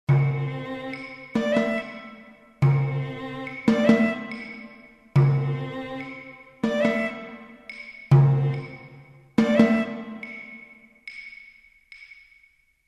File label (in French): tutti